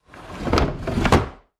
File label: Dumb Waiter Door Lowe